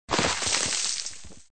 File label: Rock crumble; SFX Environment; The Binding of Isaac